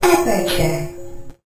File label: pre message announcer; Source Engine; Portal 2